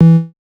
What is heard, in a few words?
reel stop, slots; garrysmod; blues-slots